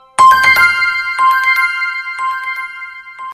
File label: android sms